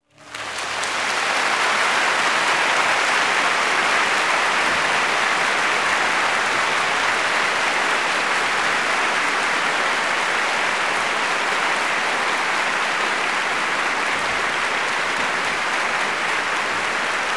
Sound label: Applause in the concert hall; standing ovation